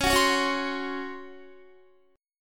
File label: C sharp Major 7th Suspended 4th C sharp Guitar; C#M7sus4 strummed; JGuitar